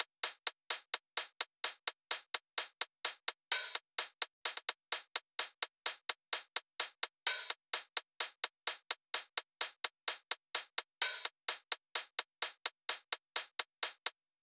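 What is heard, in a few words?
Drum Loop 128 BPM hi-hat; VEC5 Multi Drum Loop 50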